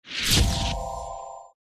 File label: Light Spirit